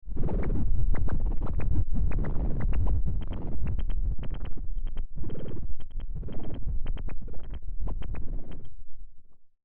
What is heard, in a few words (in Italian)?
elettronica